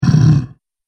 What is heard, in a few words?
Zombie, horror; Zomby Sound Effects